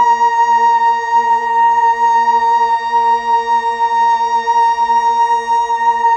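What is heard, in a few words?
Mellotron Female Choir A sharp 3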